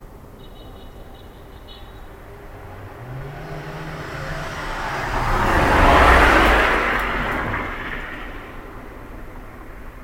dangerous car; horror

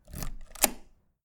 turn key

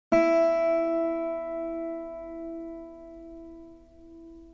guitar

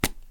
pen cap

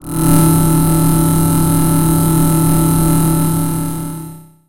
dark magic

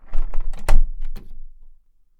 from balcony

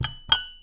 putting on tablecloth

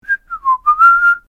whistling; human

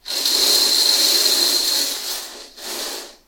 large curtains

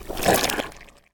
salad dressing; horror

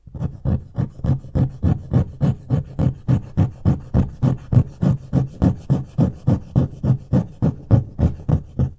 scrape wood wall

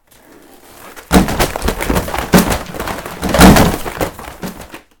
bookshelf; horror

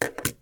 put utility cutter

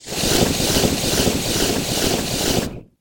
slash attack

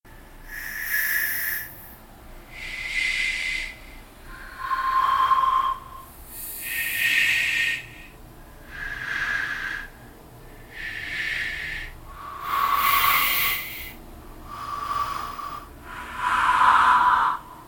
ghost sighs; horror

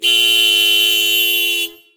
horn, car

